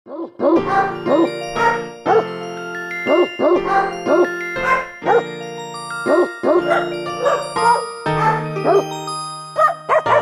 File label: Cute Dogs Bark the Happy Birthday Song